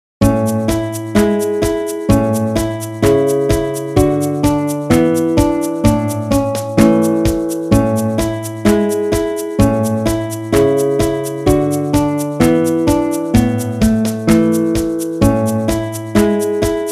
ukrainian folk song; beethoven ludwig van

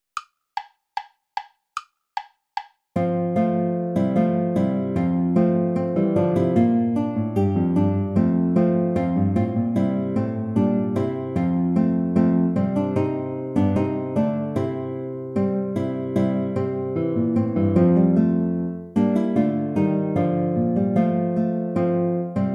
bourree play along without guitar; praetorius michael iii

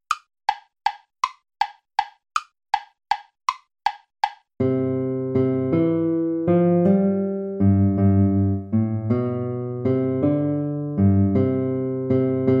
siciliana play along

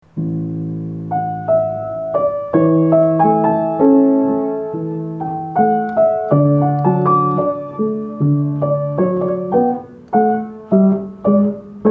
verset per organo; venti versetti

